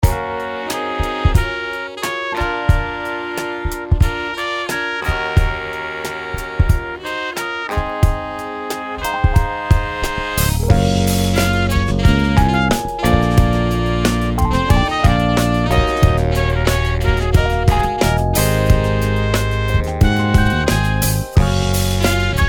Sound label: Funk Soul Music